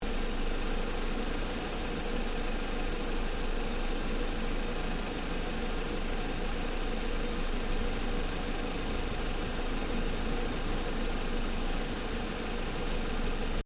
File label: Car Ford Focus Idle Engine Bay Loop